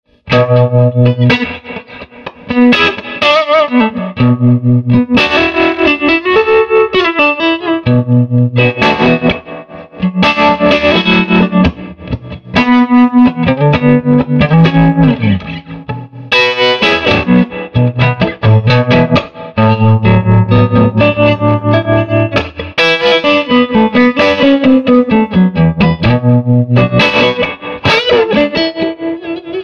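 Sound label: Tele crunch tremolo, Nocaster Tele; Swart AST Pro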